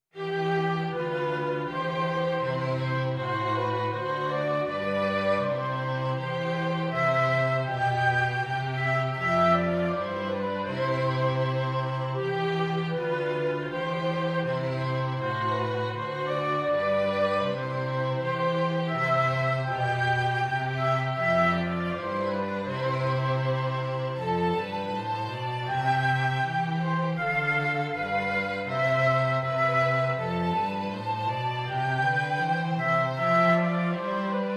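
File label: Once in Royal David's City flute violin cello; Christmas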